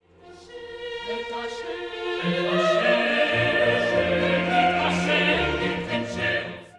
Credo Ascendit

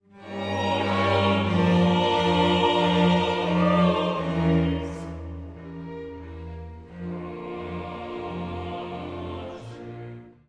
Agnus Dei Dona nobis

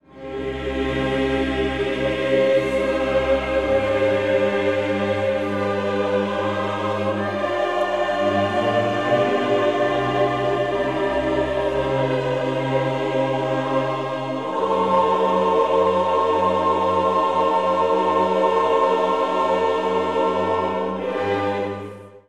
Miserere chorus

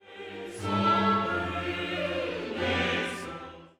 Kyrie Loud 1 note

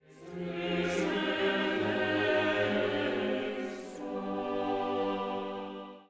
Christe Descend

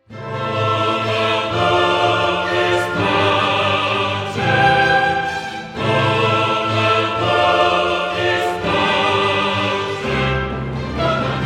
Dona chorus; K337 Agnus Dei

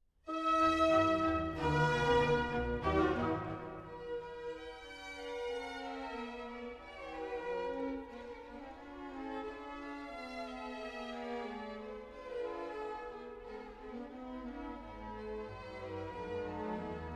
Orch intro; K427 Quoniam

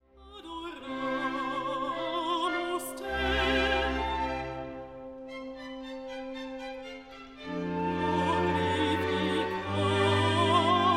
Laudamus te Alto

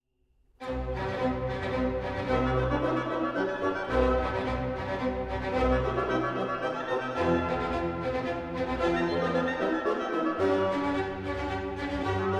Credo Orch intro

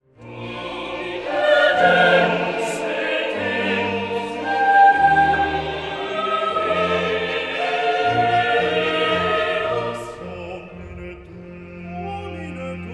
Gloria Dreamy

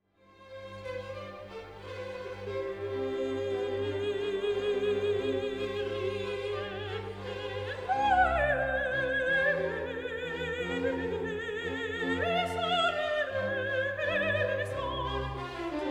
Kyrie Solo eleison; KV 192

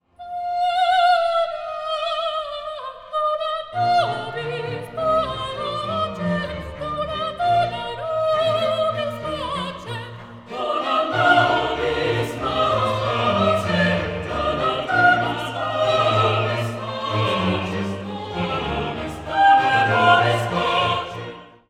Agnus Dei Soprano slows

